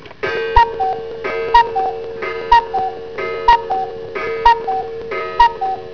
CUCUCLK

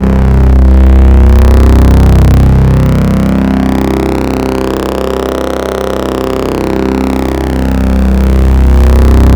moving; Mirex

